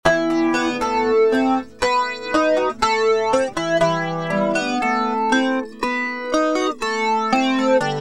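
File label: acoustic guitar; dod r870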